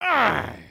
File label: knockdown; creatures organic